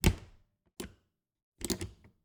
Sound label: Refridgerator, old fashioned, wooden, steel, brass clasps, hinges, close open, jostle, wide; 2 mics; Eiravaein Works; Vaeyan IV